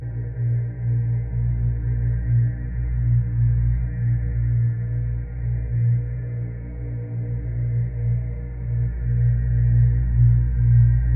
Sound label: factory boss floating Loop; enemies; unsighted